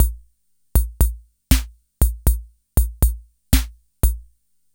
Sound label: HABANERA; INIS - Funky Xtreams